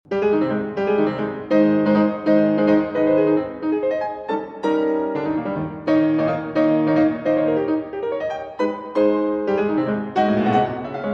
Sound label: Fantasie in C Major Presto; Op. 15, D. 760 Wanderer